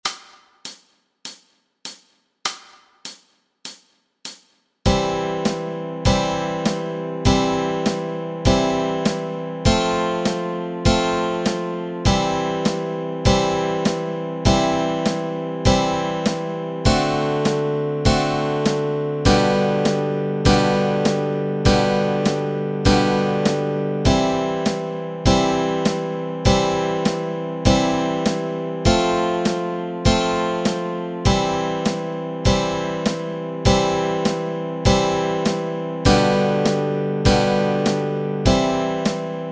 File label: chords